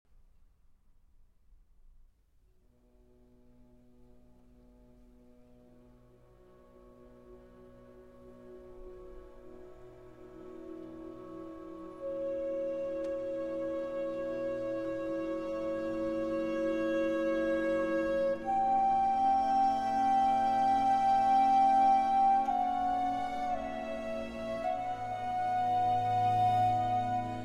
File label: Flute Concerto in G Minor